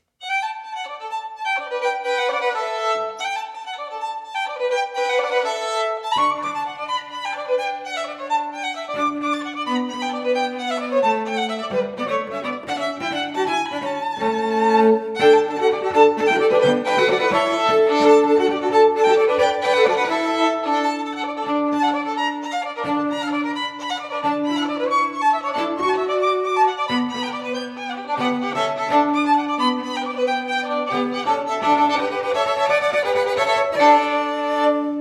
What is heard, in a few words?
jig